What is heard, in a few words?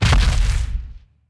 Woosh